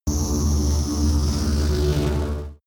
extrinsic buzz, energie

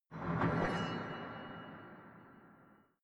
sword ringing impact; maschinen; soundpool

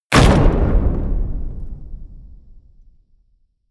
hit wood, hits impacts

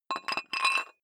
dumbbell thread put on weight, fitnessstudio

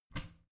bathroom cabinet warped open regular; objekte; tueren

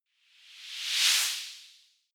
whoosh simple long high; swooshes-swishes

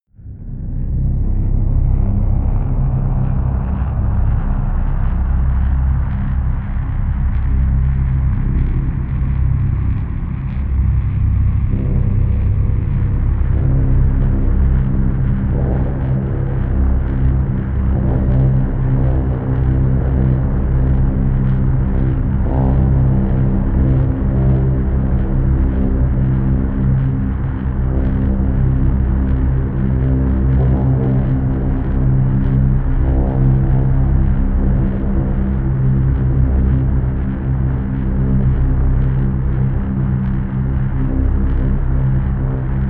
drone crackle

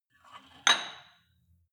weight rack insert weight medium, fitnessstudio

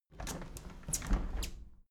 window handle plastic squeak close tilt soft; objekte; fenster

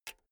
pull out jack cable; objekte; elektronik

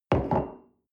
coffee place table soft

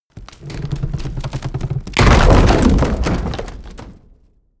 wood medium crash; industrie wirtschaft; baugewerbe

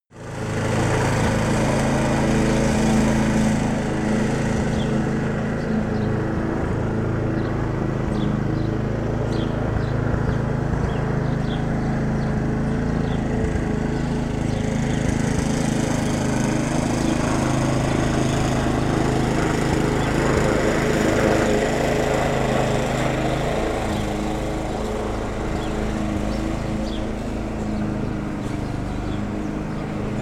mowing lawn; haus-garten

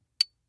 small metal impact medium high damped; industrie wirtschaft; metall

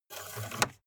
car bmw e46 exterior oil rod pull out fast

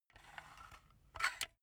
silicone gun empty pump slow; soundpool

